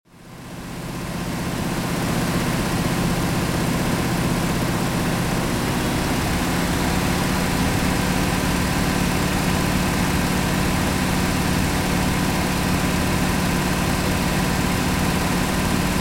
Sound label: Truck Top